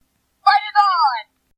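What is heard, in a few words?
Wii Remote Sound; Pit; Wiimote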